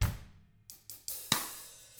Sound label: Double Platinum Drums, COUNTY; ILIO; Double Platinum Drums 1